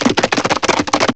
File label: GALLOP, ANIMALS; M8; CMI Factory